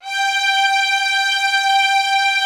G5, LOUD VIOLINS; Q Up Arts; Denny Jaeger Master Violin Library

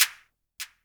clap, Korg ER-1

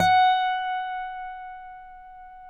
Finger F#5 L, Guitars; Spectrasonics; Hans Zimmer Guitars VOL-1

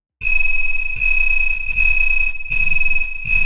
Discordant Loop (120BPM); musicradar

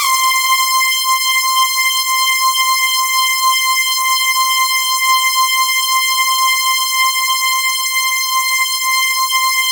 C5, Synth Line; 90 s Sample CDs; NiceBeats; SynthLine VOL-26

